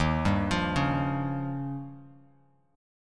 Eb 9th, Bass Standard; arpeggiated; 11,10,15,6; JGuitar

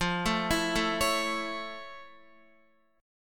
F Augmented Major 7th F Guitar; F+M7; arpeggiated

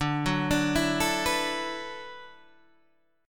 D 6th Add 9th, Guitar, DADGAD

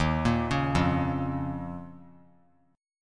D sharp 7th Suspended 2nd; D#7sus2 arpeggiated; JGuitar

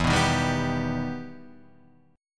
E Minor Major 7th E down Guitar Half Step Down

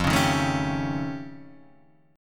F Augmented 9th F, chord

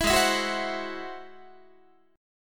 Ab Augmented 7th Ab down Guitar; Ab+7 strummed

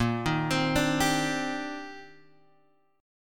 C Minor 9th Bb down Guitar Standard; arpeggiated; JGuitar

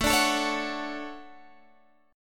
D Major 7th, chord

